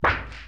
Cymbal Ramp; Lost Tapes From Mars

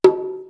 CONGA 1; East West; Global Sounds

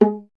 viola, solo instruments

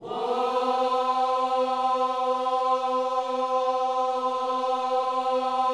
WHOA C 4, Gospel; Spectrasonics Vocal Planet

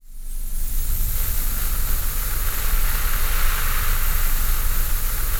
VOCAL WIND, Jazz FX; Spectrasonics; Vocal Planet

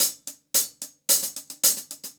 Hi-Hat Groove; Sonic Foundry (Sony Creative Software); Vital Drums

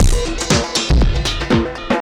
Beat, Drums, Eclectic Electronica

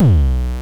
FM Kicks Distortion B1; Drums; LookIMadeAThing Kicks - Vermona Kick Lancet

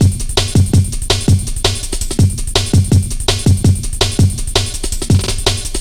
mandeville, Drum Bass Drumloops 165bpm; Zero-G; Total Drum Bass

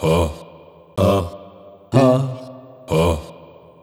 Vaguely Ethnik Voices C, Lounge 125; Zero-G Kocktail Kollection; Construction Kitz (Lounge)

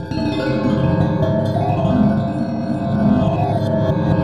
WATERPHO; Roland - LA Composer vol. 1

